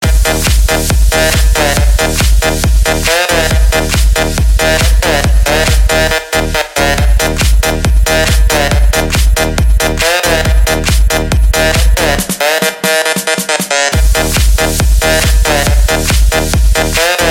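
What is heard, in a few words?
Loud Techno Ringtone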